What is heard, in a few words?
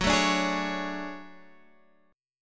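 D Major Sharp 11th G flat, chord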